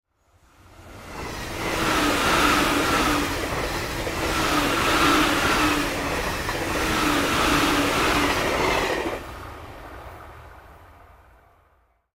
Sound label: Train passes